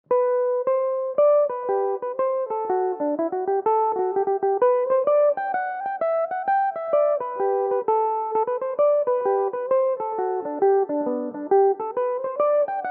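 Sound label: hornpipe G major, Irish mandolin; Pretty Maggie Morrissey; Belmuse EM-190 electric mandolin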